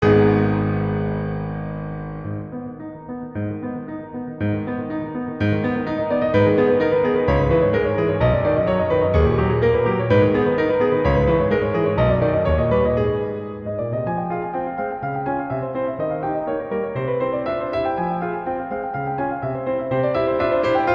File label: piano